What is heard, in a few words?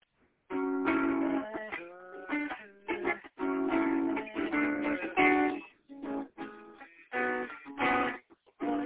minor to major bossanova ish